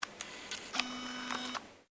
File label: Camera Digital Canon IXY 60 Switch On; Cameras (Sound Effects:Electronics)